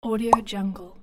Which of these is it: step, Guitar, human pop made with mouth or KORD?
human pop made with mouth